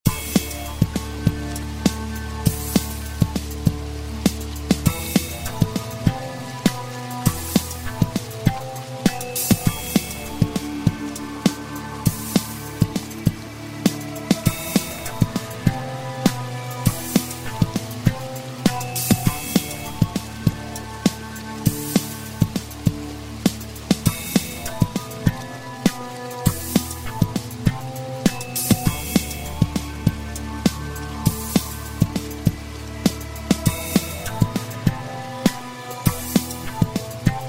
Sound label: beat